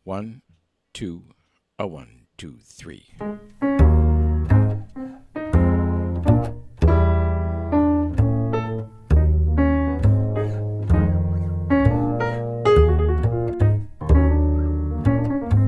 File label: minus Drums